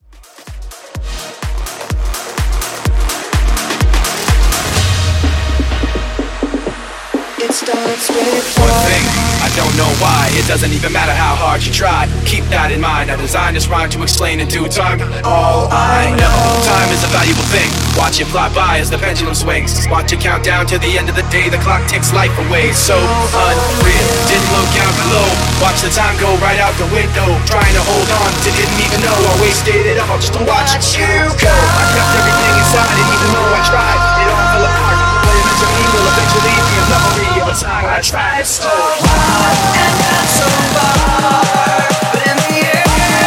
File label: Hybrid Mashup 126 CLEAN